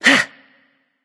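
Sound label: grunt attack, female; smite; voicepack; hera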